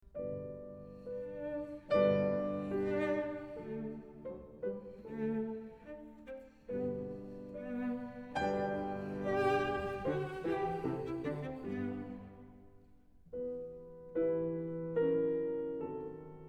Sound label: 12 Variations for Cello and Piano